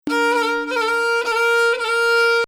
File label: Ajam